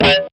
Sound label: bad note; Clone Hero